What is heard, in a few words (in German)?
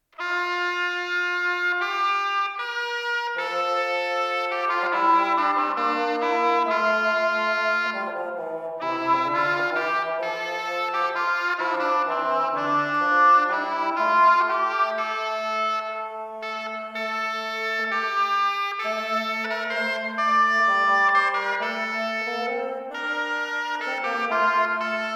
schalmei pommer